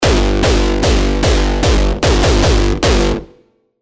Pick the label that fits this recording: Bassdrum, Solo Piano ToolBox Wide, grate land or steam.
Bassdrum